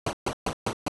Stairs, Walking on stairs (entering/leaving a cave or dungeon); LOZ